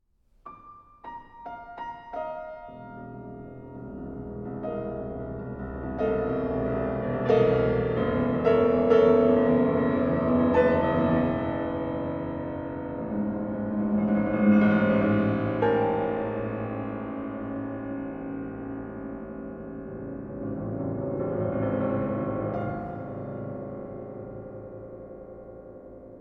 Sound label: piano; It Comes In Waves; James Kirby